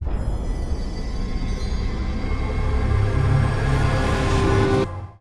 near win riser 5 sec; netent

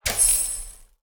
pick n click Success

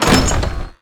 hatch Open; netent

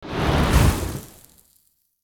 near win stopping; netent

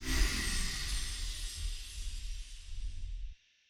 respin end

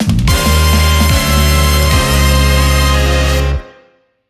cash stack intro; netent

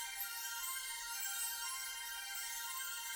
Reel spin; netent

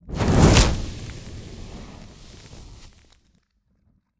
scatter hit

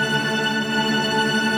Big Win Loop

